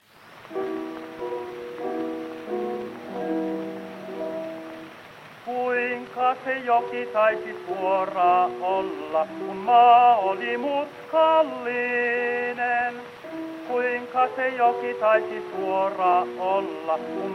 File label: Gramophone